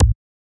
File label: Glitch, One Shots, Glitch FX